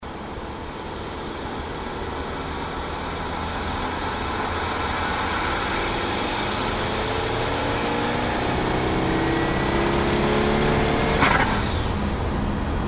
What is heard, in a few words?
4th gear pull